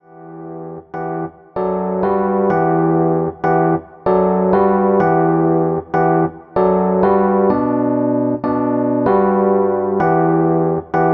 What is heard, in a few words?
Rhodes chord, hip hop chords; rhodes chord 15